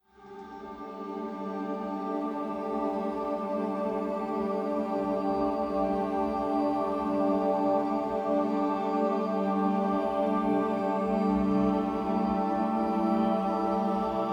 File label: Nature Sounds Tonal Meditation Baby Sleep